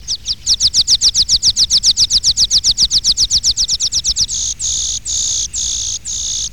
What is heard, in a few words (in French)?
spioncelle